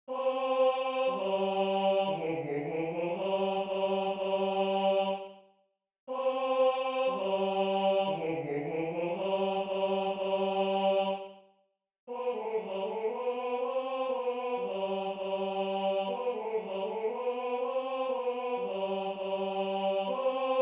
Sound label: Tenor II; Bacchus